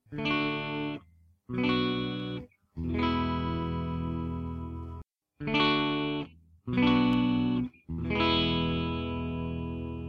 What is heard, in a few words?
chords clean neck bridge